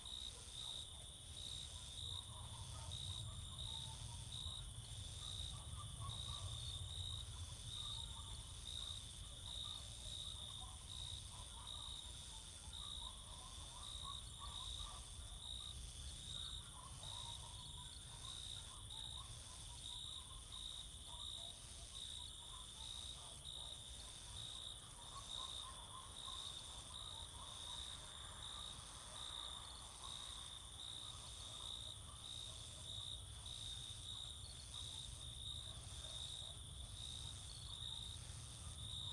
summer in the fields evening night crickets sing close dogs barks all around calm night; fvtt sonniss gdc audio